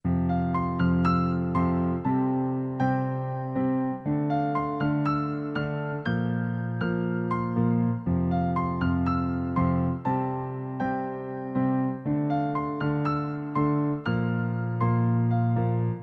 samsung s10 instrumental

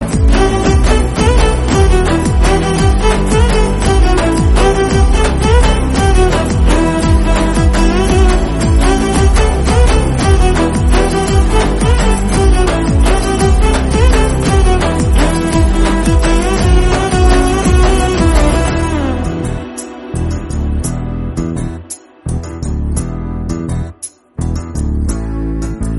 telugu bgm; chal mohan ranga

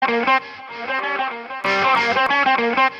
Sms Tone

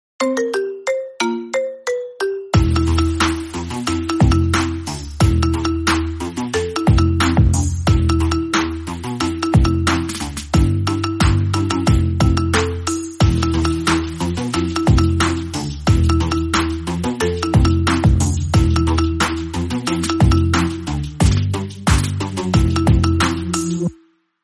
marimba instrumental

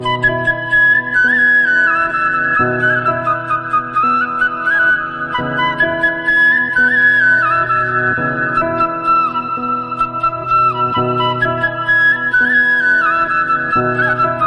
flute bgm malayalam

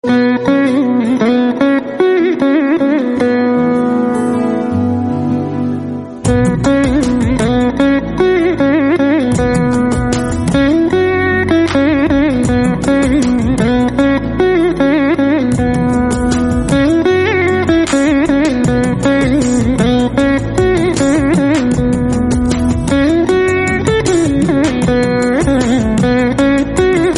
instrumental song

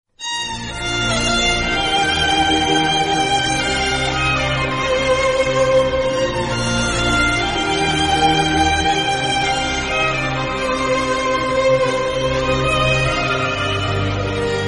Sad BGM

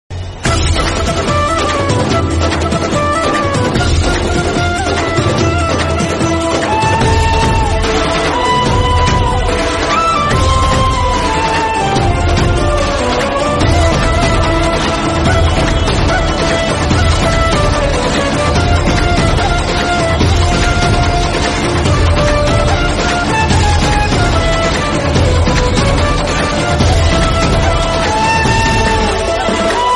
flute; krishna

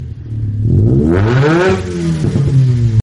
mazda rx 7 exhaust

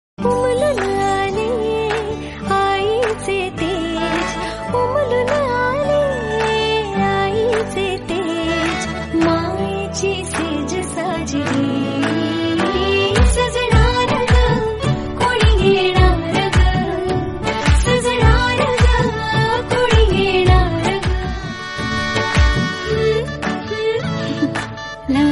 marathi song

